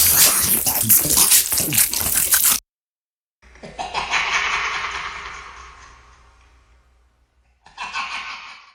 monster eating laughiung

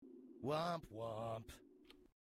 womp womp; sebastian solace

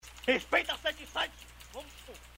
thunder

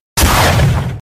stand punch

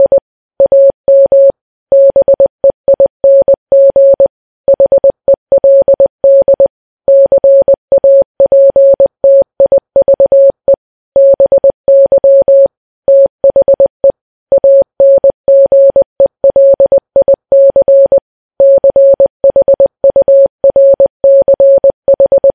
morse code funny moments

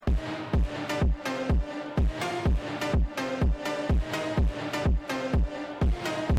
phonk

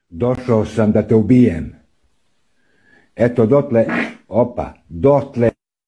fart

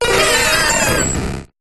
mew

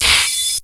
apple mac crash

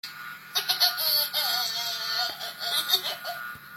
laughing

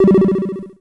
beep censor; doris teodoro

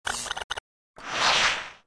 christmas card sounds out

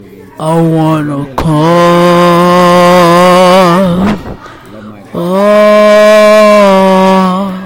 aughhhhhhhh augh augh aughhhhhhhhh

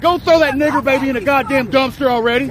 dumpster

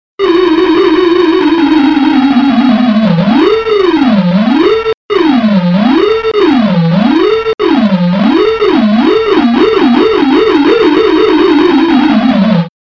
funny sound

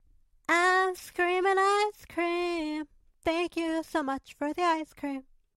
ice cream sing

